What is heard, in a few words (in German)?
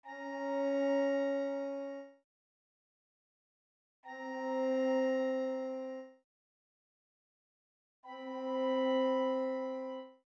Septimen